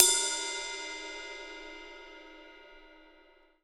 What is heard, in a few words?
RIDE20 EDG, Drums; Big Fish Audio; Ross Garfield Drums VOL-2; GM PERCUSSN